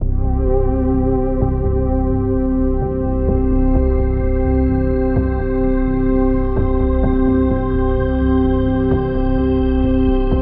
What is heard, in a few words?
Elec Ambient; Electronic Ambient Music Pack